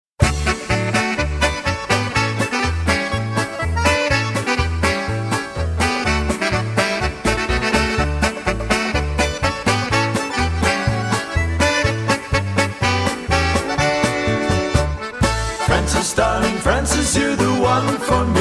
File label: Polka